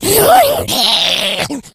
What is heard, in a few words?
rage, infected, male